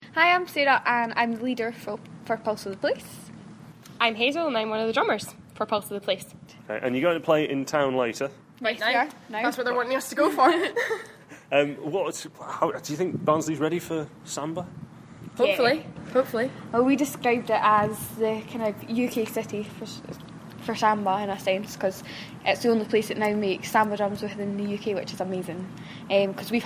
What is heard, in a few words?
scottish samba band in barnsley